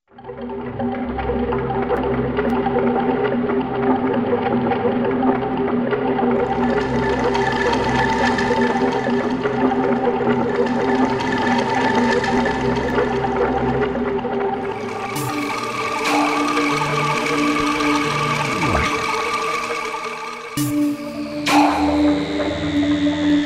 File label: soundscape